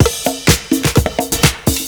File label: PERCS, 70 s Breakbeats